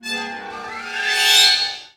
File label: Exploration Stinger